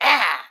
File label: Attack; Pecheneg Gray